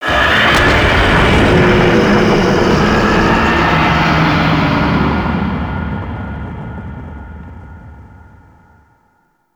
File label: Infested Hive Destroy Rumble; Gameplay; Warframe; Corpus Infested